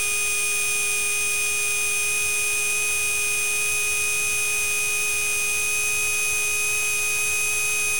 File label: motor